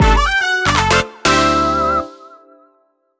Jingle Opening; Clubhouse Games: 51 Worldwide Classics

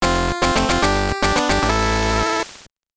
Jingle; Doraemon 2 Animal Wakusei Densetsu; Game Boy

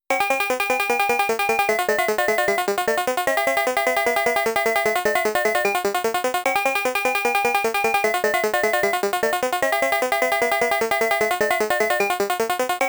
Hurry Up Music; Dig Dug

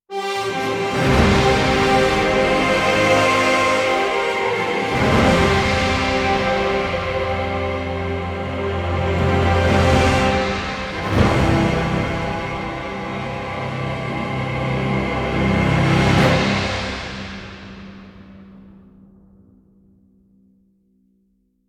Music Win; Chaos Reborn

